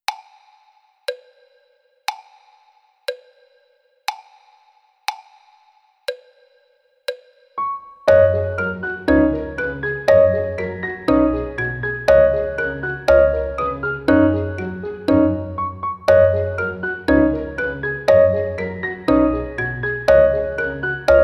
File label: The Old Clock Slow